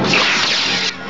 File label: hand phas, phasers